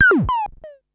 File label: Perc Synth Raw